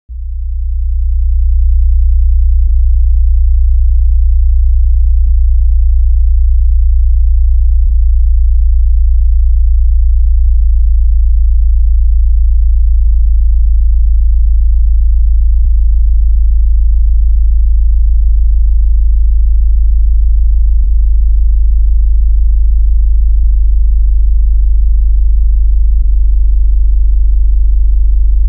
bass test with massive enhancement

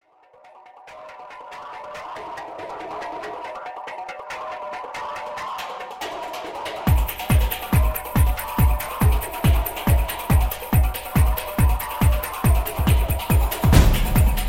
accelerated drums

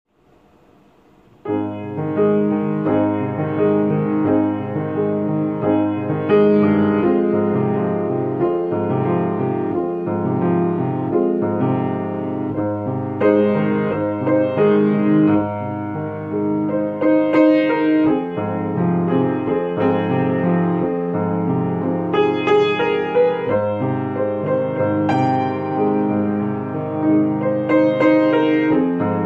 piano instrumental version

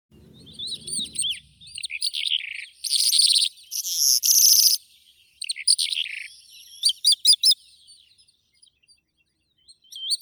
zhavoronok stepnoi melanocorypha calandra; zvuki prirodi

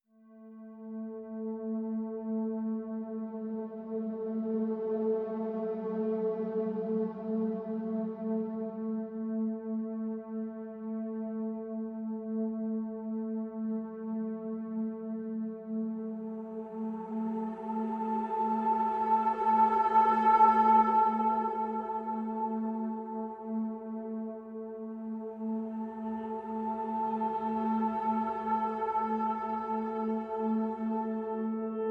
pads only

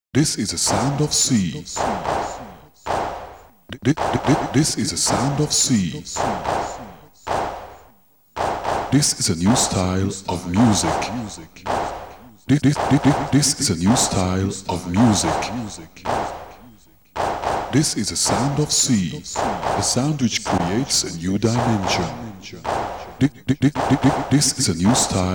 the sound of c accapella

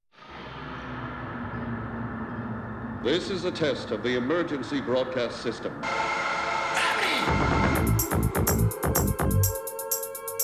stompin trumpet

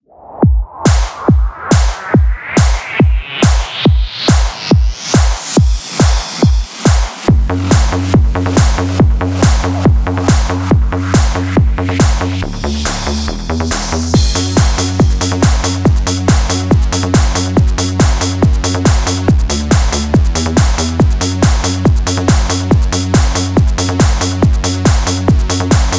church bells instrumental

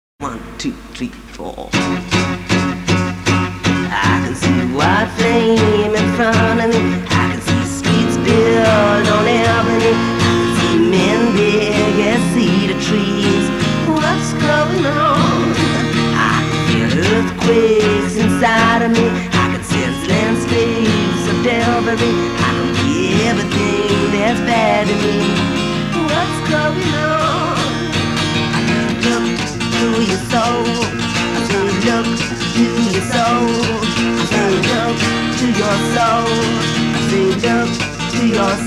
acoustic

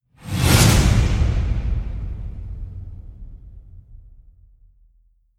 whacks collide with whoosh

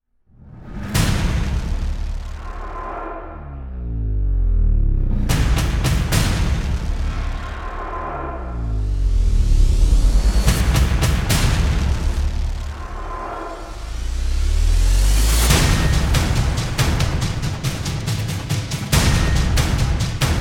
percussion only

